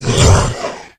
become alert, male, infected; npc, alert

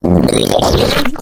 female boomer alert; voice; Left 4 Dead 2

